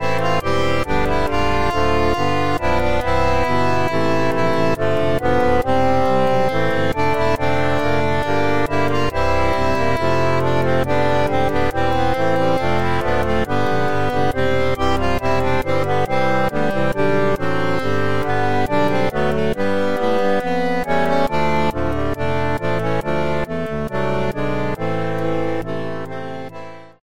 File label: Flutina Accordion; Harmodion; Syntheway